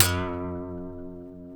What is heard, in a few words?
G, funk guitars